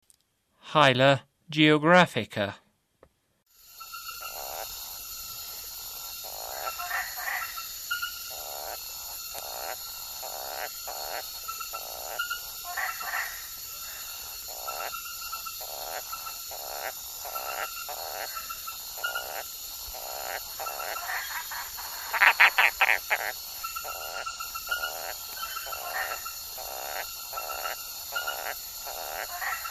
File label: Hyla geographica, Frogs of Ecuadorian Amazon; S. America